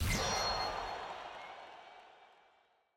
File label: status hype